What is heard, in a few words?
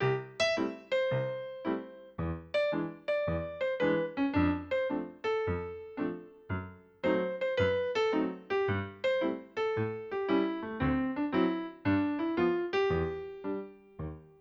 melody; c major